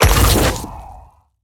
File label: moving box unpack